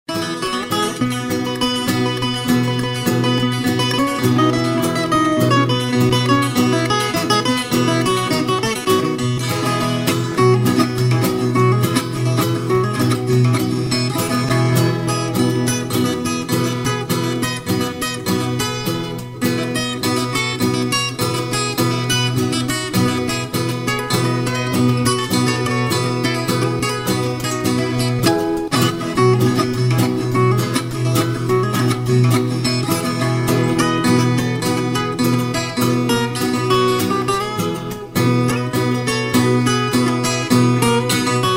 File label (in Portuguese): viola, seleção de pagodes